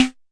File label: mbrute prc, Arturia MiniBrute; DRUM MACHINES